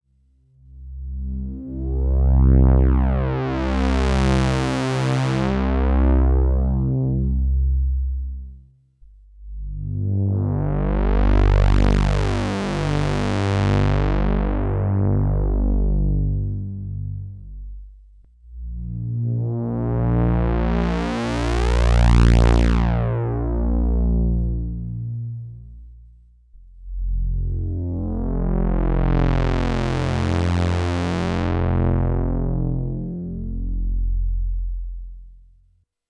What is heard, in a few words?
korg ms-20 lpf; king korg